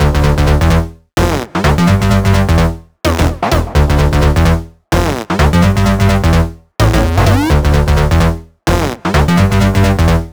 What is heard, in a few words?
128BPM Melody; Vengeance Samples; Crystaline Kit